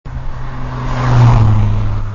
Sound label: doppler